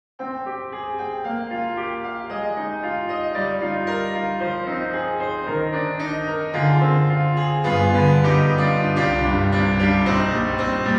White Grand Next Door; VI ONE

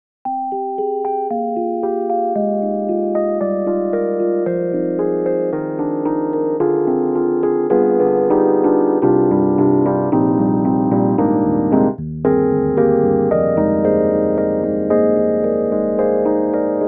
EDIROL Super Quartet, Touch Rhodes

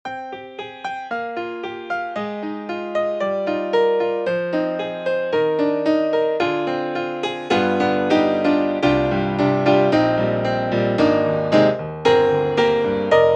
Concert Grand Grand with Overtones; Native Instruments; Vienna Concert Grand

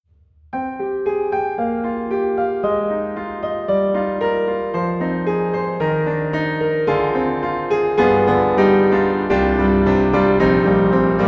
Pianoteq D4 Classical Recording BA, piano; MODARTT S.A.S.

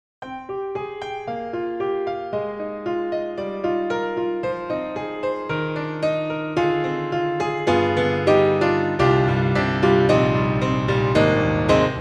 Grand Pianos Studio 7ft Pursuit Studio Grand; Synthogy; IvoryII

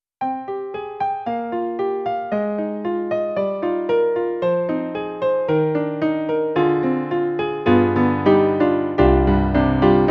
Warm Piano; E-MU; Sounds of the ZR Expansion ROM

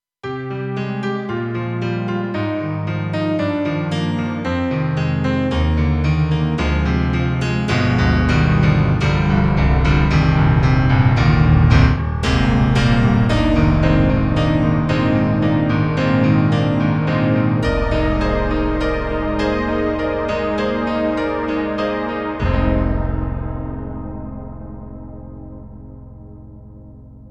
Piano and String Pad; KORG EXB-PCM08; TRITON-Rack

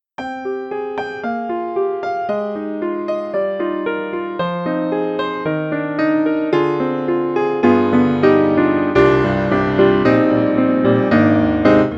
PEARL CONCERT GRAND Lovely Mono; IMPACT SOUNDWORKS

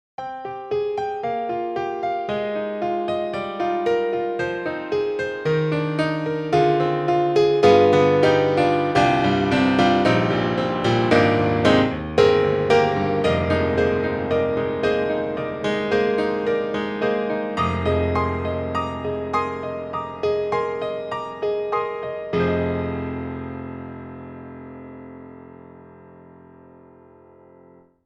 Grand Pianos German D Rock T-shift Grand; Synthogy; IvoryII

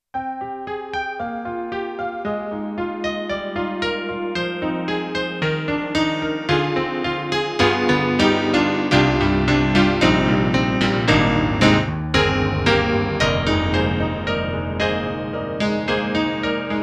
EDIROL SD-80, Brite Honky

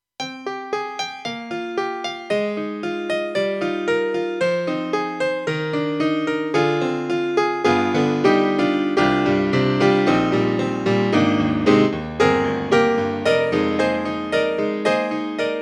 AKAI SG01p, LIGHT GRAND

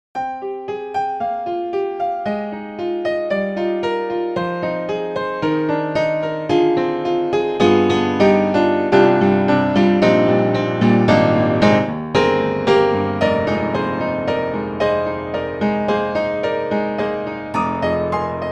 Pop Modern Upright; Upright Pianos; Synthogy; Ivory II

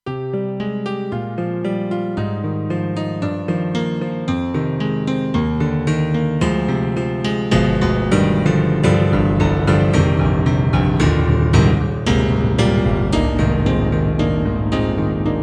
KORG X5DR M1 Piano

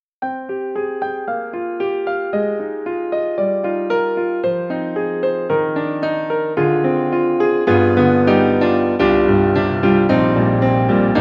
Piano; KORG; KRONOS 2

